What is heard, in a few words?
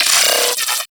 Arachnoid Micro Vocal Pain; Warframe